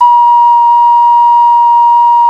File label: Clay Flute